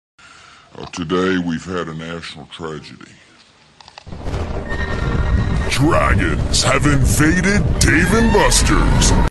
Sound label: dnb; reversevape